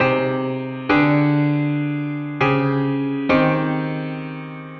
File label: Hardboiled Piano 100 C, 100bpm; Loops n Lines